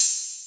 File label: ride cymbal one shot a sharp key; cymbal drum samples